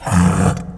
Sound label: bear hit